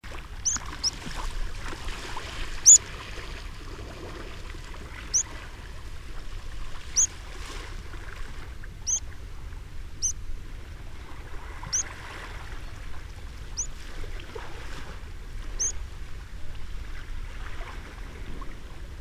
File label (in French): pipit maritime anthus petrosus